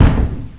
door bang; ambience; caged

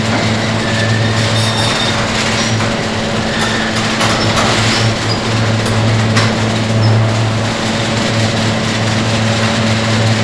lift; sven; caged

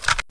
flare gun reload; weapons

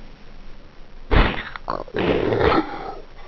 pain; sven; aomclassic; monsters; spitter

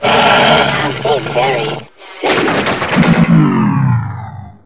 gonome death; gonome hev

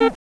blips; ambience; caged

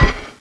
axe hit; weapons

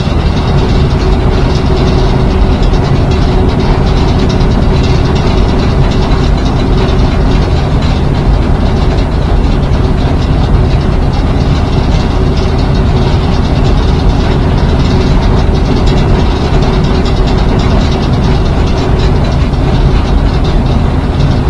vent rum; ambience; aomdc

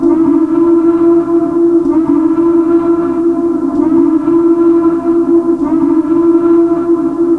alarm, ambience; caged

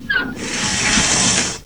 scrape, ambience; caged

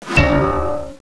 pipe hit; weapons

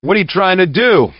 shot

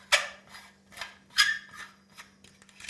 repair sound; items

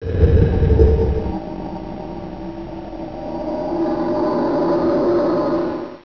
die; sven; aomclassic; monsters; lurker; ichy

